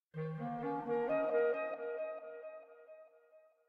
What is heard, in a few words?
Arp; Proteus